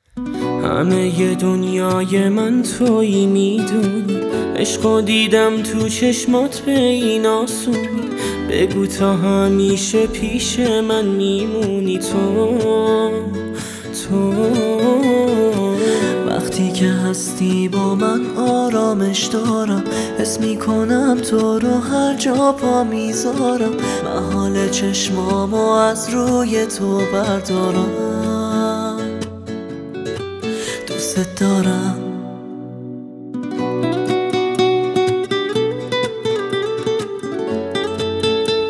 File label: Unplugged